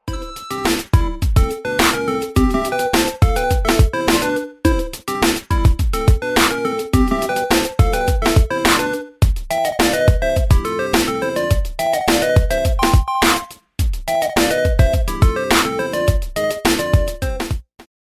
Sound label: game cover hip hop beat; super mario